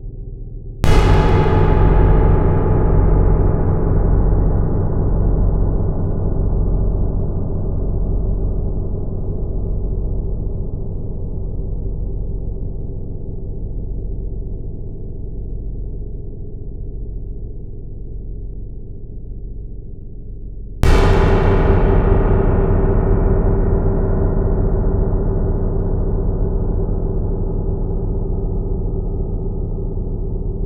Messing about with Karplus in Max

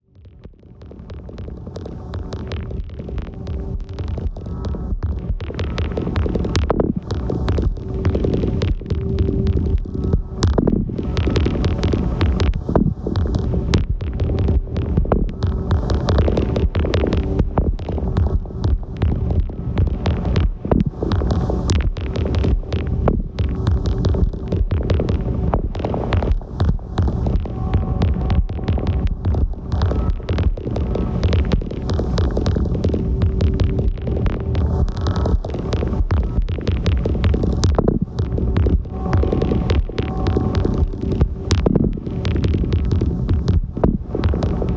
sp404-serge-ot

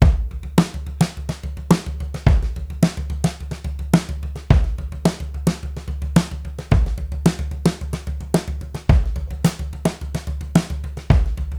Drum Break; hip hop beat battle; loosely rock themed

